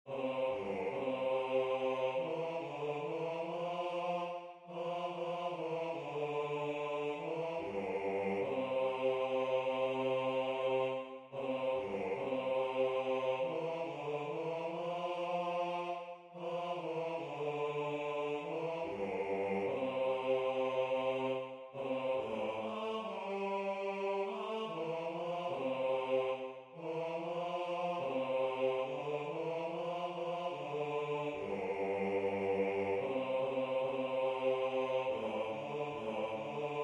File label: Bass